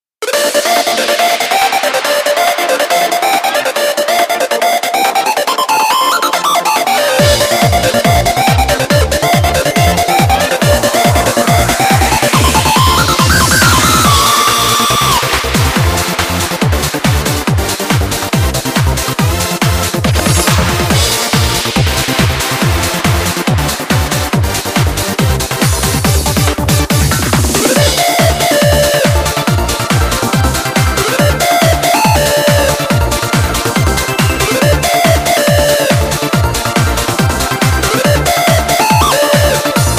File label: poppin beeps; weeklybeats-2016